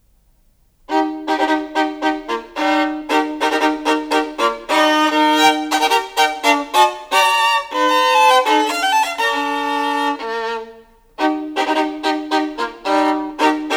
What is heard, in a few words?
Caprices for Solo Violin, No.14 in E flat major; Paganini - 24 Caprices